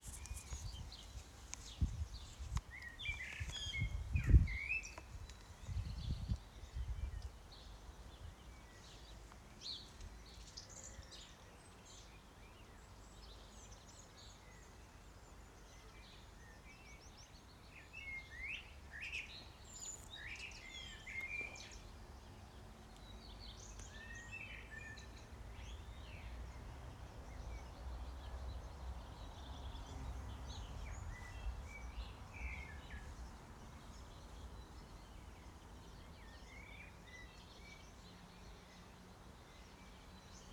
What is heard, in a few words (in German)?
Amsel